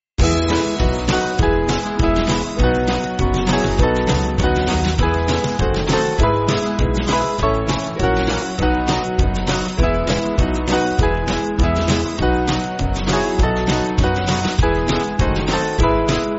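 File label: Band, Music Backing Files for Churches; Small Band; 2/E; Praise Glory Power; Harmonist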